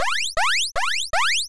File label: pickup; lego-city-sea